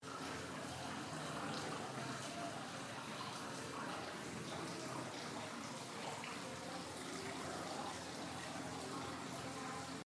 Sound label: distant wedding and sub tropical house botanical gardens birmingham